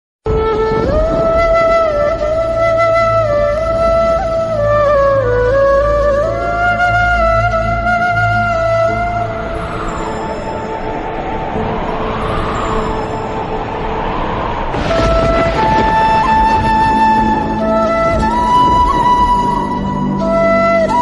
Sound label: flute ringtone bansuri ringtone instrumental ringtone; radha krishna flute ringtone